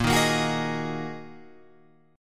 A sharp 7th Suspended 4th Sharp 5th, Guitar Open F chord; strummed; JGuitar